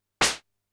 snare hard, Drum Machines, Formanta Radio Plant Polivoks